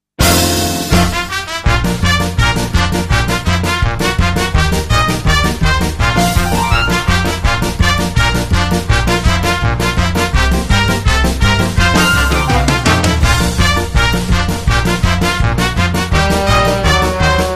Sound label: galop